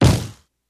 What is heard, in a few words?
Camera flash, flash pot, sharper; cameras